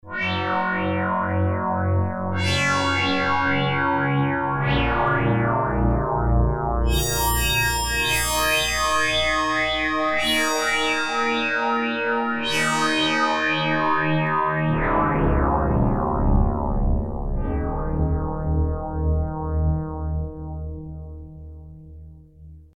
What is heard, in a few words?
yamaha dx 200